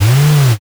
servo

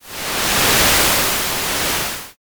wave

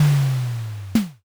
castle gate

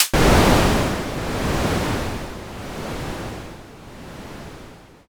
thunder

